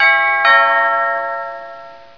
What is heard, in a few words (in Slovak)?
Zvonec pri dverach; Domacnost; Revelation Sight and Sound SK